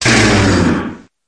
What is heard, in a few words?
cry